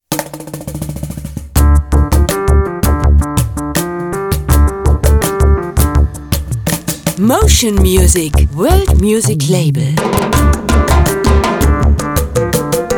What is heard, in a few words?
jingle; Mocean Music